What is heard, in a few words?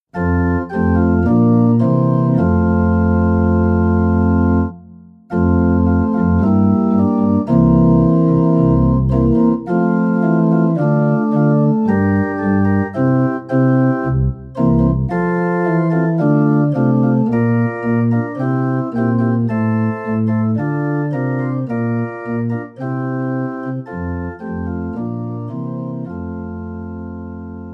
accompaniment